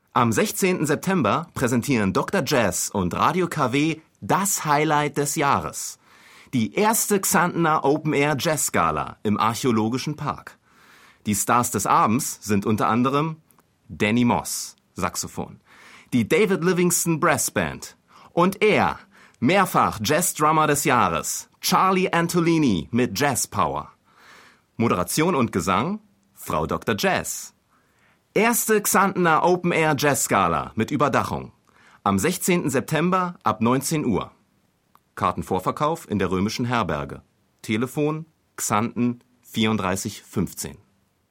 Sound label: Jazz